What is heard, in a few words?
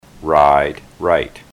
ride right